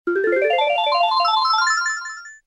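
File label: next message tone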